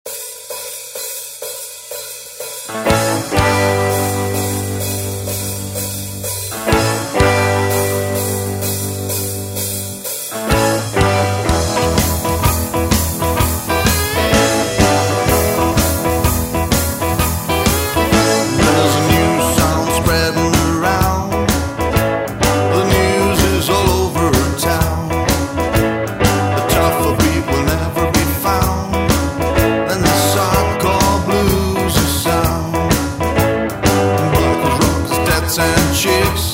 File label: Cool Cat Rhythm N Blues